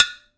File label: Glass Jar, household rhythm samples Hits